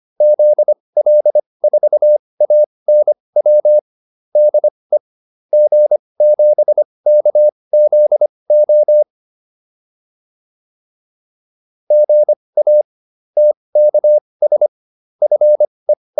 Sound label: QSO, advanced practice files